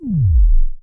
Sub, drum

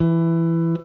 Guitar & Bass; Quantum Leap

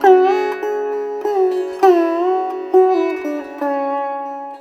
SITAR; Spectrasonics; Heart of Asia

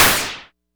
Cwejman S1 Clap; Bish Clap; DevSnd Cwejman S1 - RD

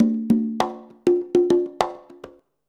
CONGA, Modern Percussion Loops

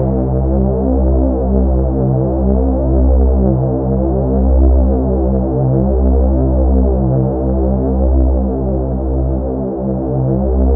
C1, Synth Line; 90 s Sample CDs; NiceBeats; SynthLine VOL-18

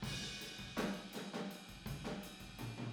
Boogaloo Fill Room ST, 82 BPM, Drums

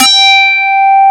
QUITAR

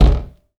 Cupboard Door, household rhythm samples Hits

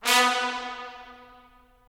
BRS, Tps Stacc; Roland - LA Composer vol. 4

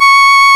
REED C#; Big Fish Audio; Synclav FM

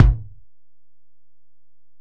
Ac BD, Alesis DM-PRO; 300 Drum Machines

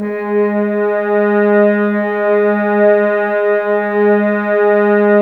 Brass F.Hrns G#; Miroslav Vitous - Woodwind Brass Ensemble

